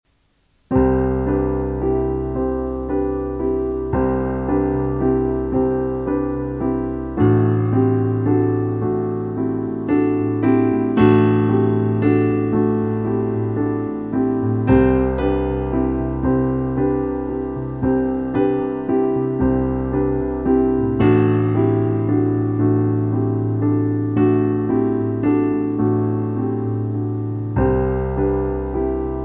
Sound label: PIANO HACCORD